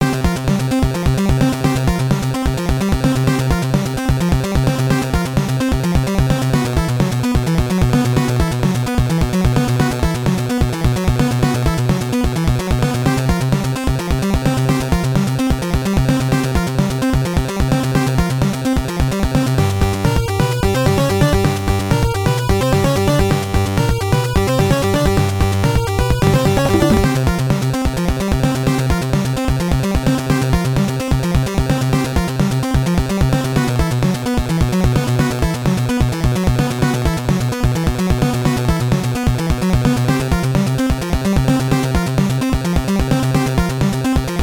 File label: Boss fight; Gradius II; NES